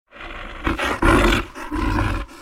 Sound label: crawler idle; shadows